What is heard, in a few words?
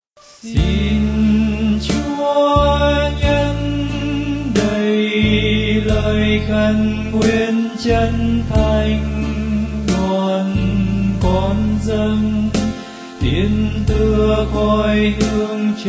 tenor